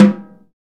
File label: SNR SNARE, Orch Snares; Roland LCDP03 Orchestral Perc; 90 s Sample CDs